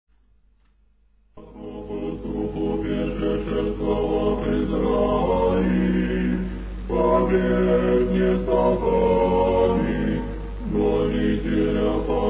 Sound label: irmos; octoechos